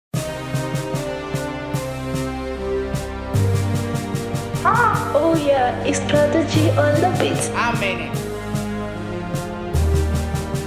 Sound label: FreeBeat; Prod by Strategybeat